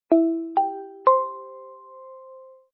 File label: countdown end; Feishu